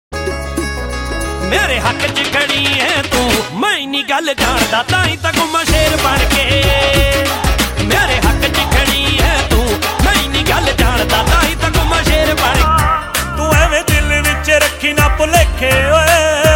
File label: Punjabi